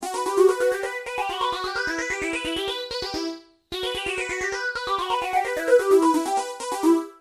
fast, stage phaser; x5dr

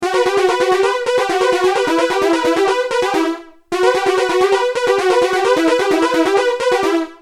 Next chorus; x5dr

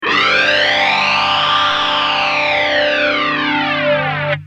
gtr ibanez fl99

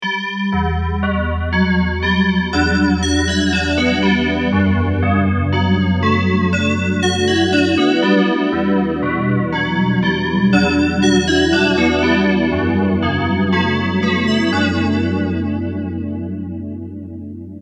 DX7 bells, ADA flanger

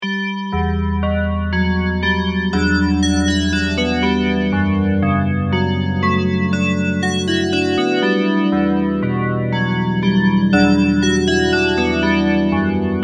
dx7 bells dimension c

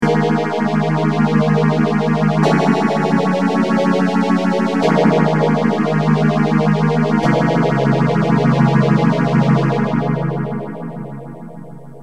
m1000 boss ph1r

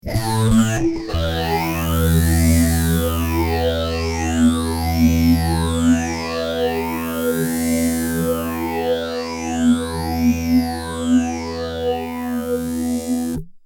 fuzz gtr

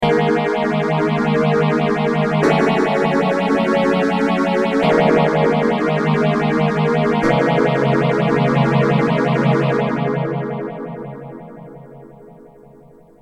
m1000 phase II

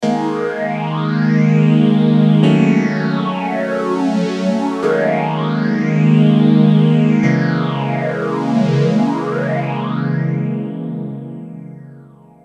Mutron Phasor II

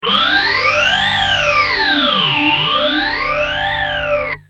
gtr

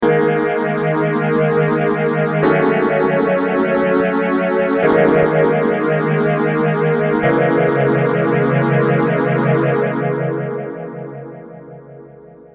Mutron phasor

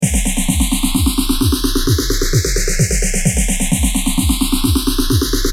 drumloop; boss ph3

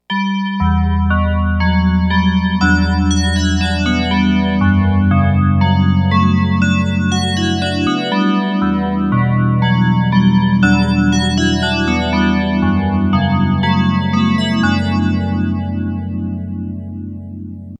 dx7 bells tc xii re

